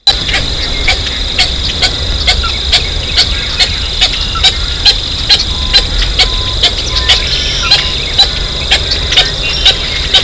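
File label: King rail kek; National Marsh Bird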